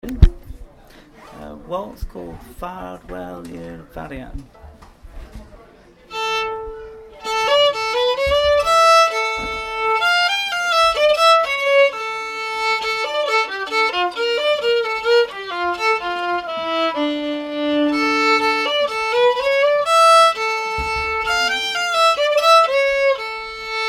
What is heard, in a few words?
Welsh Tunes Waltz